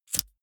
lighter wheel spin flame burst

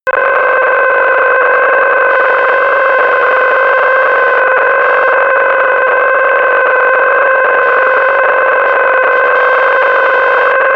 atmosphere old scanner

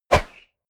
whoosh air

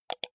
computer mouse wheel click fast

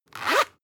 zipper close fast

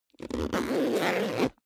zipper backpack close unstable

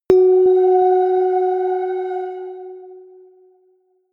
melodic odd ghost bell high

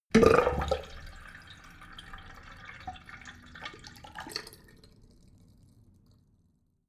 sink fill light short

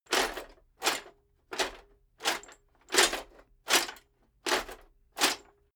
metal plate armor movement sequence

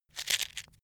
match take out

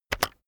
keyboard external enter press very fast heavy

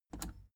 plastic button scratch smooth push disable

